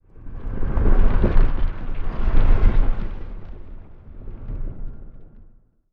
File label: ice asteroid; ambient; Stellaris